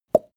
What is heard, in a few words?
plop; koerpergeraeusche; menschen; soundpool